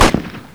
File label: 30cal; WAR